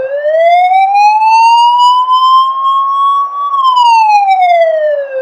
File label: wail, sirens; federal signal pa 500